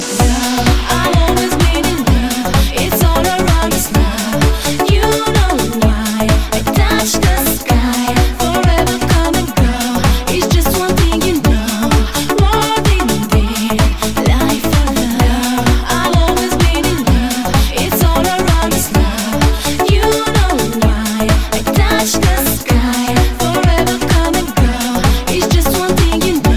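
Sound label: extreme loud, rock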